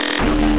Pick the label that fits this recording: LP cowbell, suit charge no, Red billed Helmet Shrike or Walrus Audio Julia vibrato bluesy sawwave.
suit charge no